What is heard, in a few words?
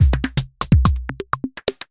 Rhythm maker loop 125 Bpm